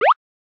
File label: jump good; Mr.-potato; nonzerobot